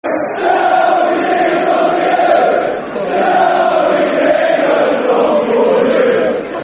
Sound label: Shall We Sing A Song For You [Football Chants (Soccer Songs)]; fanchants; Ipswich Town Itfc